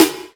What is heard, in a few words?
BRIGHT, SNARE